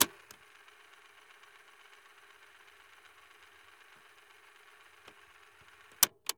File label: Gear Foley Cassette Deck Rewind; FX; Cymatics; Eternity Sample Pack